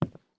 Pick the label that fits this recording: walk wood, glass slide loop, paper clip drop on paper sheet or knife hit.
walk wood